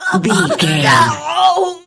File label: voice female death intense